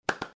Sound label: Soft Clap Clap